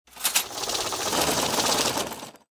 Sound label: Cash Register Ticket